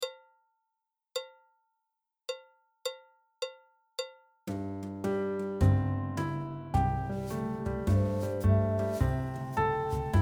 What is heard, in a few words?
Old Texas Backing Track; im going to old texas now solo